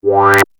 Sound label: wah, Yamaha CS-5